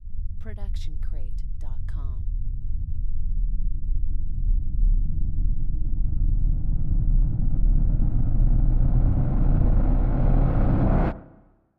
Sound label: underground swell; soundscrate